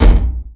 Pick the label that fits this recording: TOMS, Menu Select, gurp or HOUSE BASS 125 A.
Menu Select